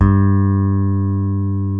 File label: P BASS G3, pick bass